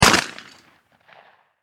Warfare Shotgun Beretta Single Shot Close Perspective 20 Gauge; SFX Bible 6